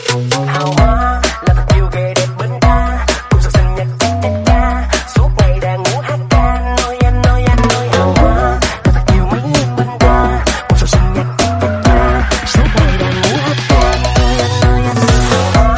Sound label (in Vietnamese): Nhac Chuong Tiktok Nhip Dieu Cha Cha Cha